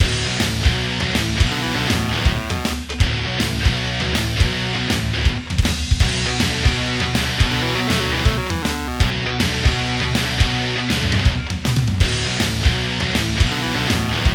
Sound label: 3d Music